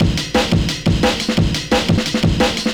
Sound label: Big Heavy A-Men Step 175, Drum&Bass Breaks; EZ Rollers